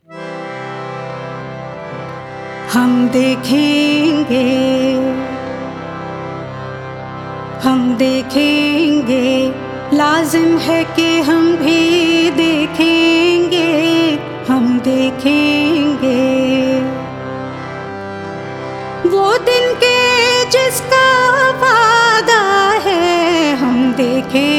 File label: Unplugged; Sounds of The Kashmir Files